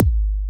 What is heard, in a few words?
Kick Deep Tech Kick